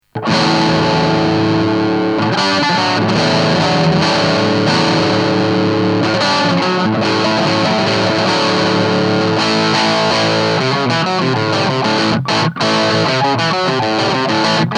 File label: crunch; lampes